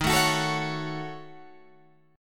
D sharp Minor 7th Flat 5th D sharp down Guitar Open G